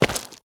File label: move